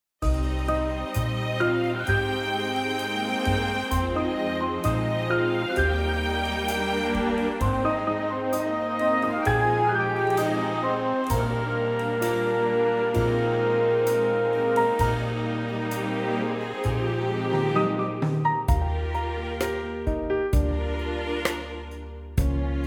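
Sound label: orchestral Eb F, backing track; key - Eb to F - vocal range - Eb to Bb; Gorgeous and fresh orchestra arrangement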